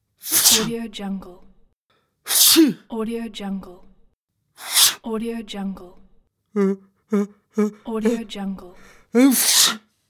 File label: man sneeze sound; mandarin sound